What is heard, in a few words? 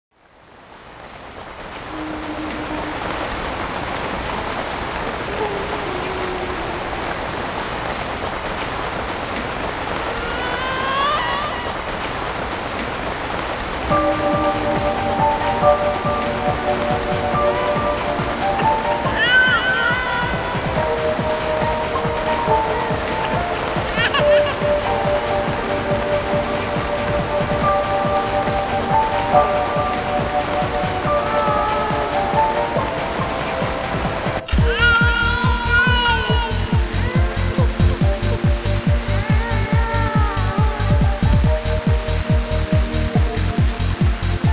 Brake; Next Vektor